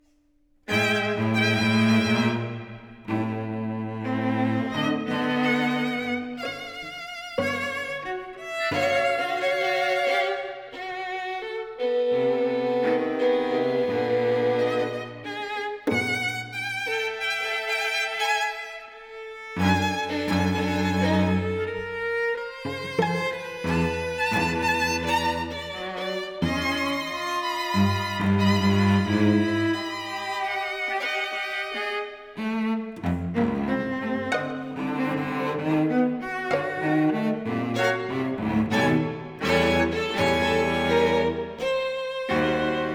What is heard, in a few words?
String Quartet; Gudmundsen-Holmgreen; Complete String Quartets, Vol. 1; String Quartet No. 3 ''5 Short Studies''